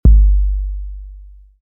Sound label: 808 before